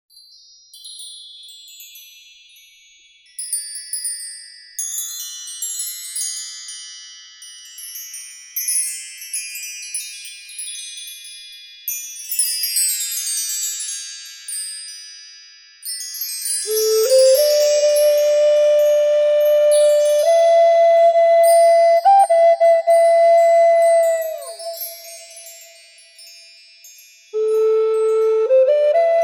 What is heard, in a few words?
Buffalo Dance New Age